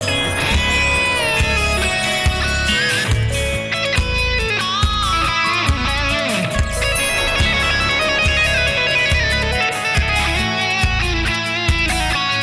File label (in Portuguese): guitar